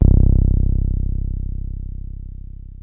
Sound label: 303 C1, TB 303; Hollywood Edge; Giorgio Moroder Rare Synthesizer Collection